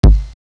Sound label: Broken Kick, drums